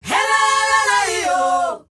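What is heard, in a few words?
"HEHLELEYO", World Voices South African; ILIO; Vocal Planet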